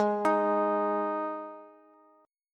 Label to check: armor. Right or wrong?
Wrong